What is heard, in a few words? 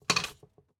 Picture Drop; Game rip; MiSide